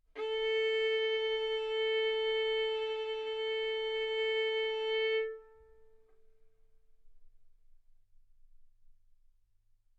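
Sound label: Violin arco mf A string A4 B4 stereo; sul A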